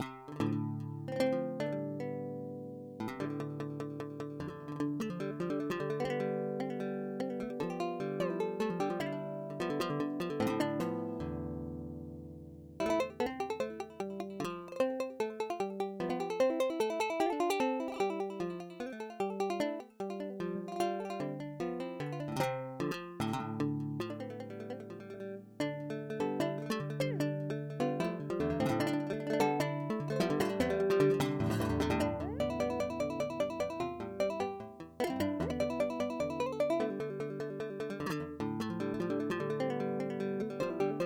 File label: guitar